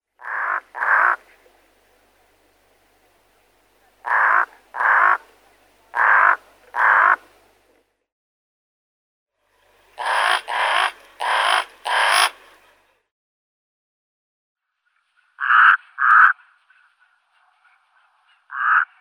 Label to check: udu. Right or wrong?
Wrong